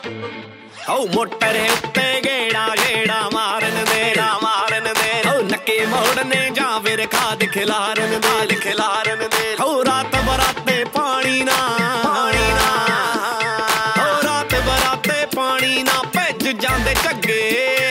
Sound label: Punjabi Ringtone